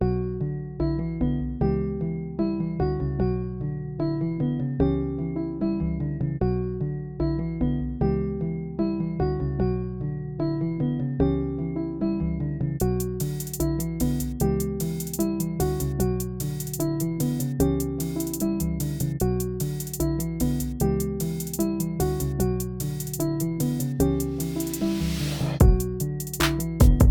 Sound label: Thunder 150BPM Em